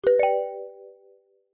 multimedia notification bright metal tuned mallet generic; zapsplat